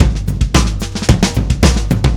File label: Drums Fill, Indie Rock; Sonic Foundry (Sony Creative Software); Indie Rock Anthems; Session 01 - Brighton